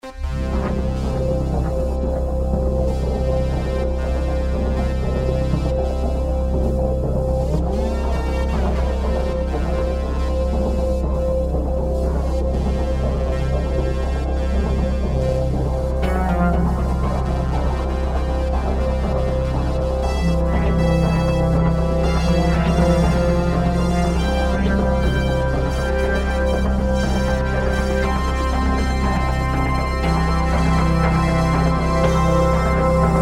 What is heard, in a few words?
epic pad, 120; Oxium